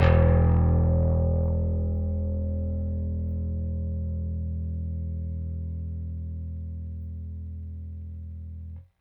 Rickenbacker Bass; Airwindows

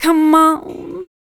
FEMALE, Dance Vocals

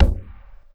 GENTLE, Anime Sound FX; AKAI Anime Sound FX

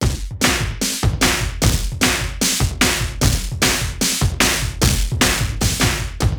Vintage Kit, drums beats

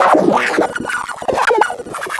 Distorted Reality; Spectrasonic; DIGITERROR

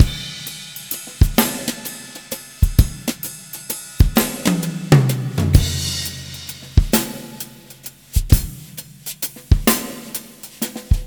drums six8 130; Quazibeat; Rock Heaven Drums

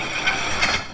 GATE SOUND

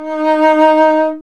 STR ARC BS, Cb Bowed, Cb1 mf vb

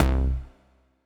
Synth Prophet Bass C; Electronisounds

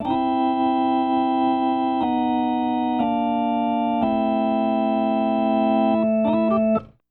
Organ, 70s Analog Funk

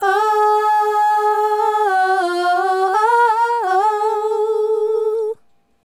Female Group, Hip-Hop and RnB Vocals; Sonic Foundry (Sony Creative Software)

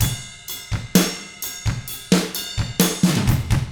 129 ROCK, Drums; ILIO; Double Platinum Drums 1

